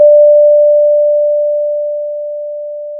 pop, Sound of a doorbell

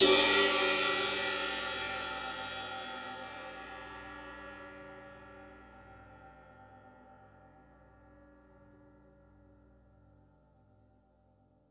Multi Crash

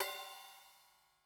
Crash, drum

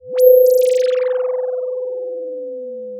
Sound of a phone ringing

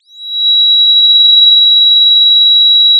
Sound of a police car siren

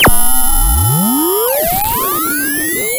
pop, sound of a bike bell

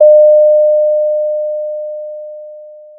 Sound of a doorbell

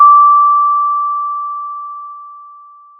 pop, Sound of a school bell

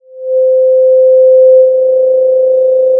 Sound of a cruise ship horn

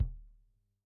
K1 close Kick, drum

